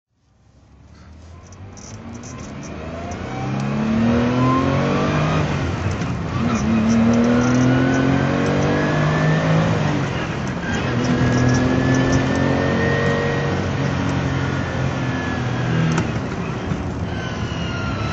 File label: porsche 911 2.4 s rally drive